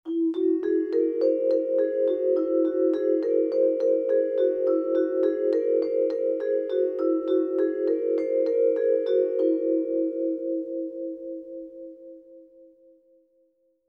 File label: vibraphone pentatonic scale. music. percussion. vibes